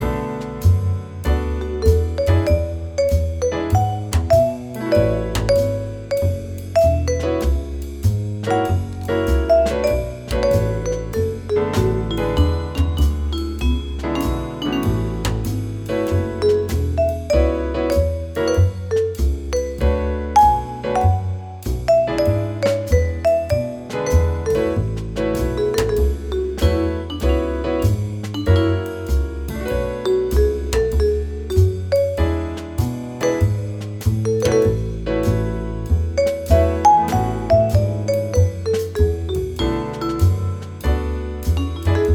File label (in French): instrumental jazz musique dambiance